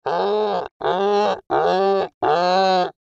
Monster Grunt Multiple Creature; Horror; Animal; Monster Sound Effects

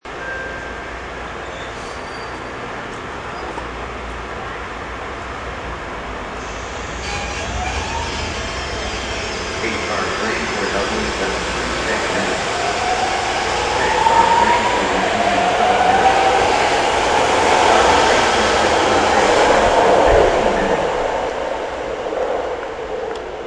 Subway train sequence; Train Station; Backgrounds; Yvette Jackson

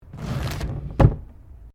Drawer Interior Wooden Utility Small Full Open

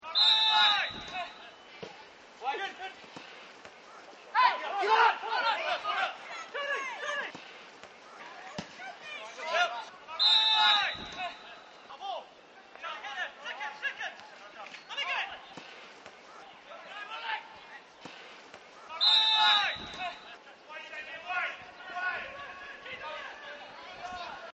Soccer Game; Sports; Partners In Rhyme

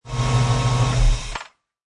Mustang 68 Fastback Shut Off Engine Perspective; Car Sound Effects

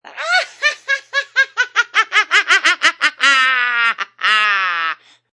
Woman Cracks Up, A female cracks up.; Funny Sounds; Comedy

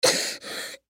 Human Cough; Cough Hard Male Ten Yrs Boy; Sick; Blastwave

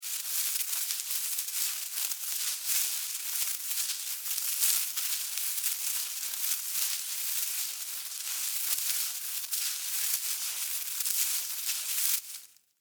Animal Critter Movement Grass Foliage; Miscellaneous Animal

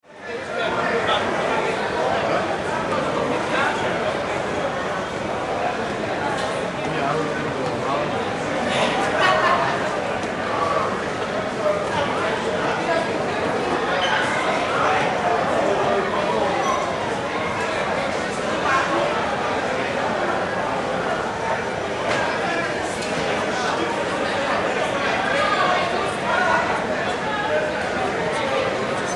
Ambience Mall Crowd Walla Large Open Space; Crowds

